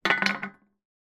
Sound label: stone down on metal drain cover; foley